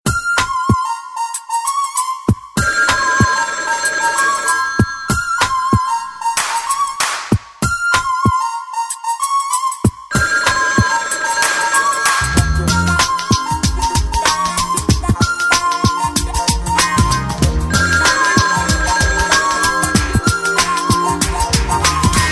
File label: New Flute Ringtone